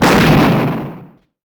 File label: bullet; sonic